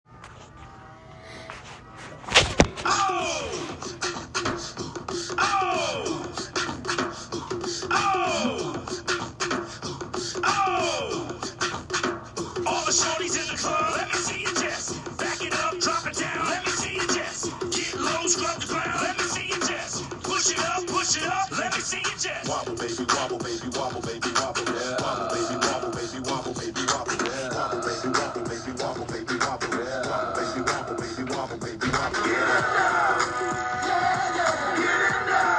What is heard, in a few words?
wobble